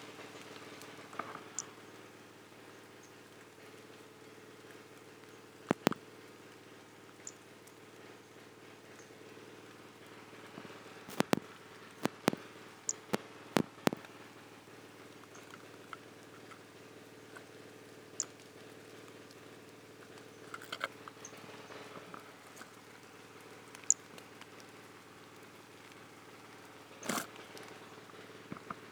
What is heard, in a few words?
Brachycephalus albolineatus